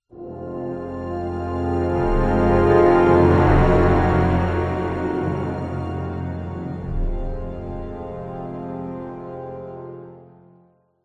Code Wheel Reveal

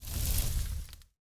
Death Lava

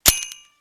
sound Pickup Bolt

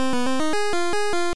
Open The Door; solomon s key nes

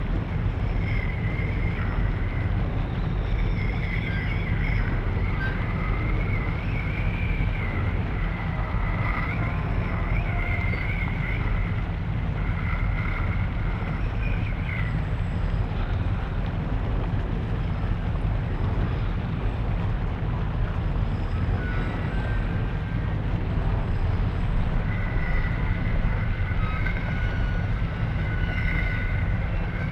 Ambient

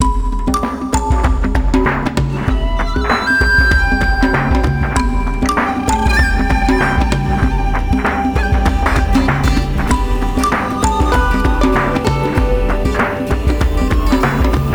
Rainforest; Frogger Beyond; GC PS2 Windows Xbox

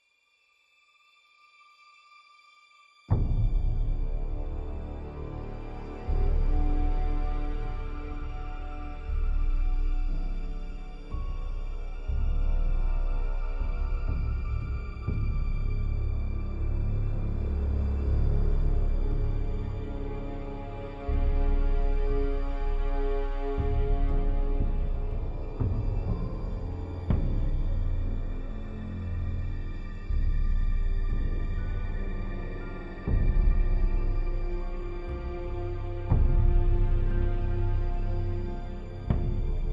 Loop Tense

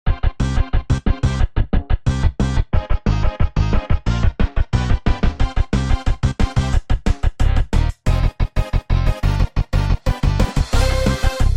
Artistic Swimming Song Rock; Doodle Champion Island Games

